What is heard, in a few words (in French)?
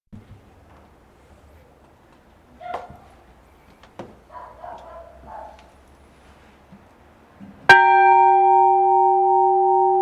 cloche; Belle et Houlefort